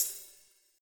drum hitnormal

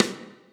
sidestick

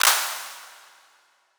clap layer

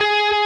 prebend full Left-hand vibrato

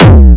big kick

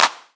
Clap

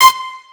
Leed Melody

